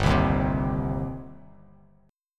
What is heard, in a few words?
G Suspended 4th Sharp 5th G down Bass Standard; Gsus4#5 strummed; JGuitar